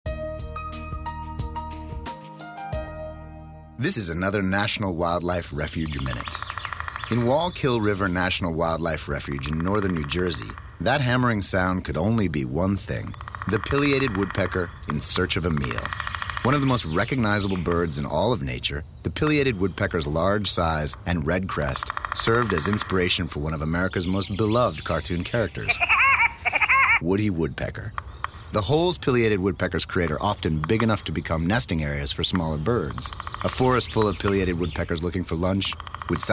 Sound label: Woodpecker; fws